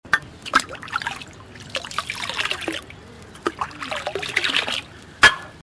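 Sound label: hand-washing basin; otyouzu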